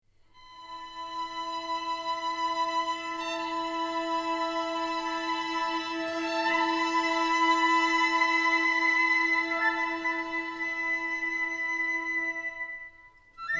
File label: string orchestra w picc fl